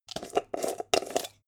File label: jar plastic midsize open long